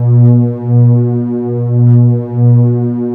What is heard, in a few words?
C 3, PROPHET 10; Sound Gallery - Charged